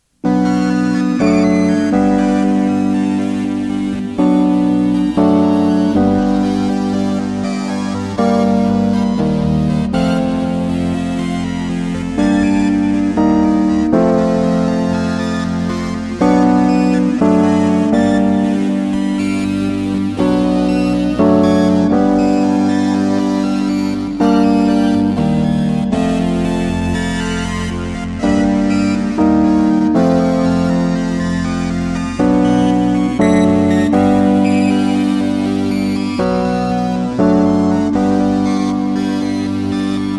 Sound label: VIRUS SEQ